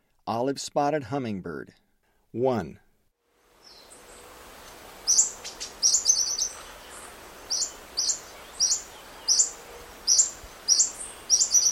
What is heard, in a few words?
Olive-spotted Hummingbird; S. America; Eastern Lowlands